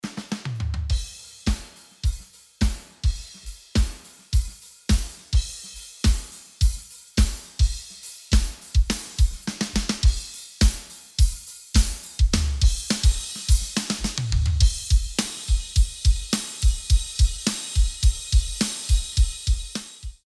Drums; klarkteknik; pultec shootout

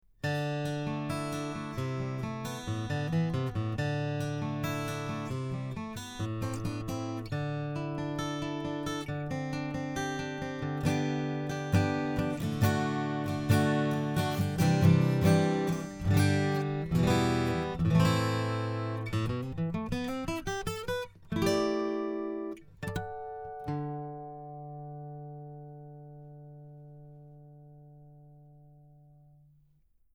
Bone ebonypins, acoustic guitar

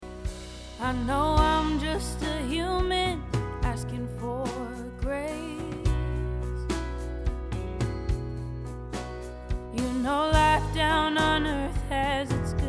Track Antelope 800, virtual mic

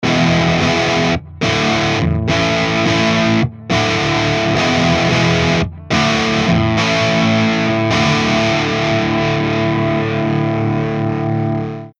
amp sim audio sample; amp sims

